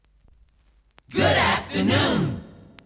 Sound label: jinglepackage; topformat LP jinglepackage 2